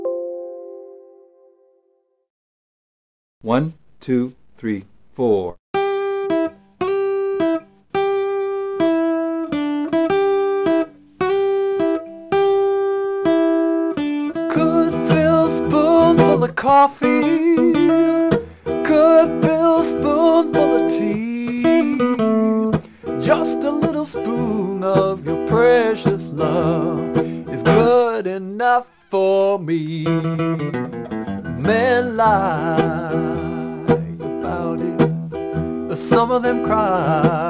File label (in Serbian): Gitara